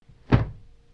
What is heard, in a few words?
Foley Big Kick Impact